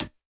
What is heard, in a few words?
hihat, house